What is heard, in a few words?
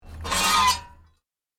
Tank hatch opening